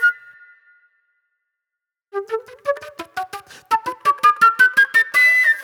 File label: Wild Flute